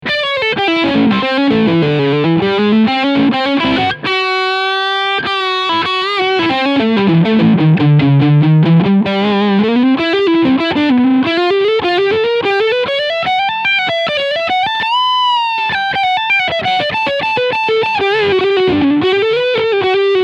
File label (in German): Epiphone Wilshire P 90 Leadsound Steg P90